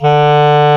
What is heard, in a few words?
CLAR, Clar Jazz